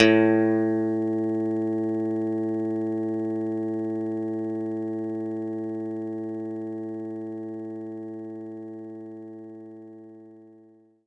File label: Clean Guitar A2; knights try